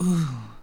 "oh" sigh

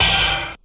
hargh, Stabs

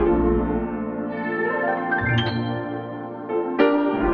piano, Phonky; loops

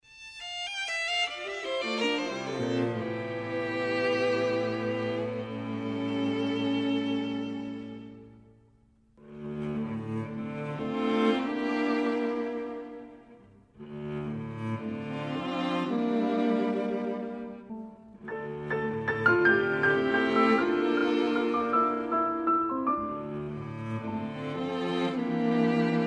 strings, sad

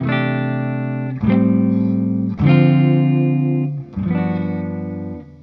guitar

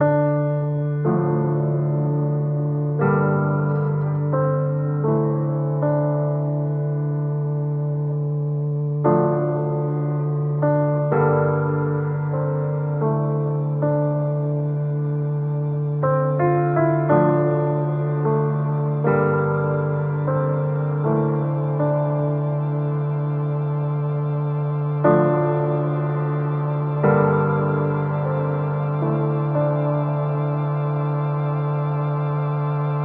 piano keys, Loops Sad

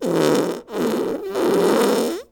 fart short three fake bursts

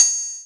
Hats Electro Open

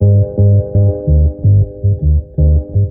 bass herbert, Loops, Phonky

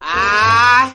ay SUNG

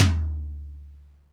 Toms, Real

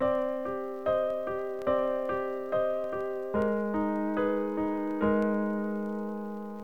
piano magnificent darkness, Sad

